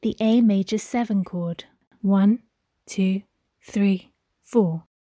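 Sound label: A major 7 chord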